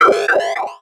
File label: Multimedia Internet CD-Rom Flash Animation